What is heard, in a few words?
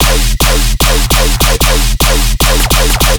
bass loop 150 E; Virtual Riot